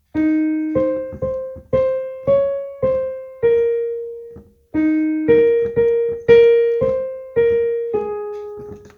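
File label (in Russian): Kukushka melodiya, cuckoo melody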